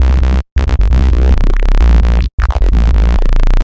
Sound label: Breakbeat Bass; 02 Bass